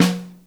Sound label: Overhead, Snare; Ableton Foundation FreePacks; Snare Designer